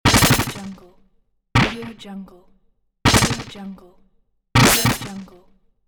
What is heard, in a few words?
game weapon shot; wildemotion